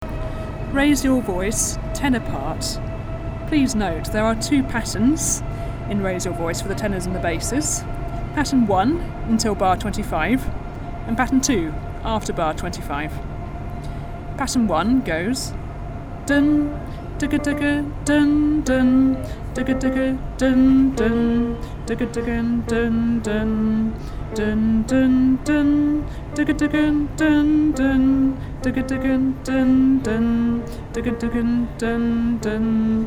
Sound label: tenor